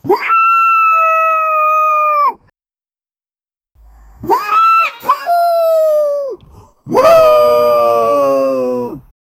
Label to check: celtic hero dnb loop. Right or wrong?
Wrong